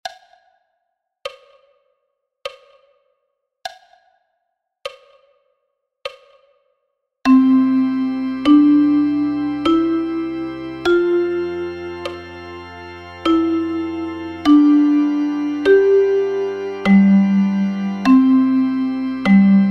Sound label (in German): Bach Choral SBFL und ABFL